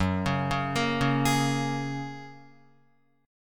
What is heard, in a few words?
F sharp Major Add 9th F sharp, Guitar; arpeggiated; 4F,4A,5C,5G,5C,6E; 1,4,1,3,1,4